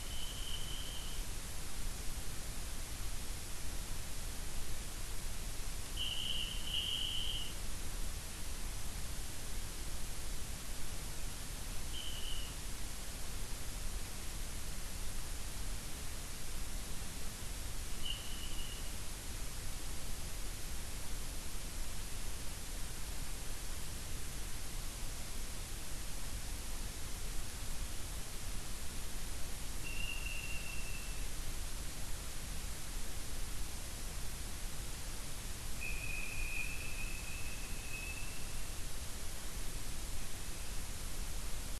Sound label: WFR audio emfisis